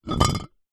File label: bottle wine drag movement pebblecrete; zapsplat